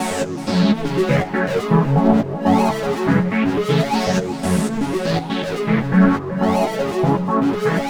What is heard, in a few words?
NRG Arp, trance; Sonic Foundry (Sony Creative Software); Trance NRG VOL-1